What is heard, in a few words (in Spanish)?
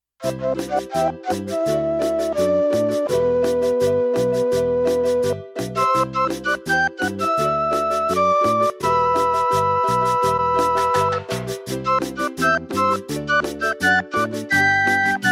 Andina, cumbia; ritmos